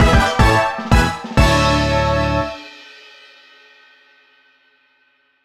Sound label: Destination Wheel Jingle; Chibi-Robo! Zip Lash